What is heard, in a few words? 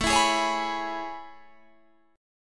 A Major 9th A